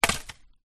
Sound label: impact stick branch land on forest floor leaves